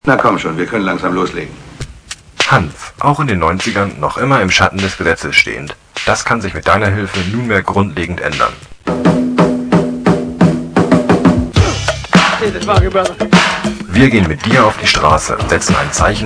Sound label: Radio Jingle; Hanfparade 98